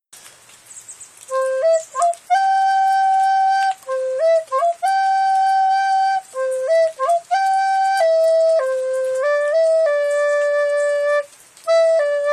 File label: Saints with squirrel recorder